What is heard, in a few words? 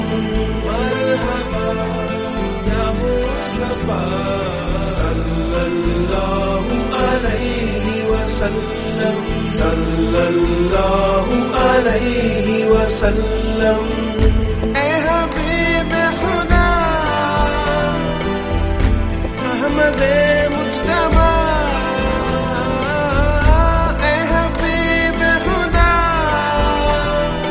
Islamic Ringtone